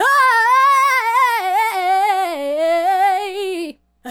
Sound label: Adlib, Hip-Hop and RnB Vocals